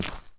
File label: step, dried ghast